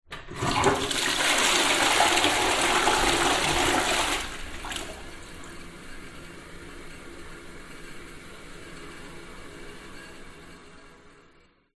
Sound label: household toilet flush binaural room tone